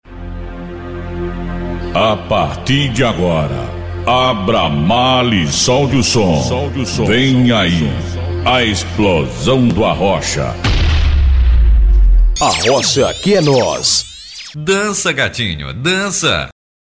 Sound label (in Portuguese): abertura